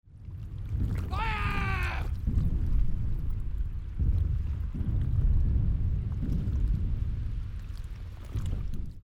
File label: Sea Battle; Age of Empires III; cinematics